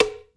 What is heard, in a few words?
smooth snare drum sound g sharp key; Duration 0:01; snare drum samples